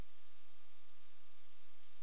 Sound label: pipi pygm, british bat echolocation; P. pygmaeus; Echolocation calls of British bats